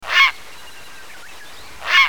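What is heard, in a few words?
grey heron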